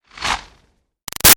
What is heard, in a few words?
Arrow By Ruffles Slow; Bows and Arrows; Sound Effects:Combat; Big Room Sound